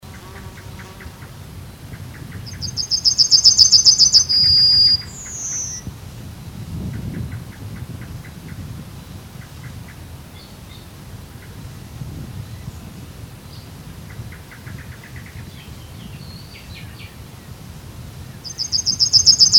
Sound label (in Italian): Zigolo Giallo